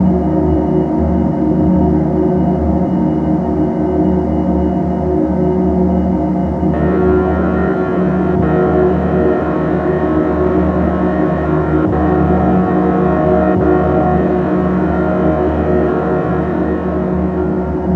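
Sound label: pad loop sanctuary 150 D# minor; Virtual Riot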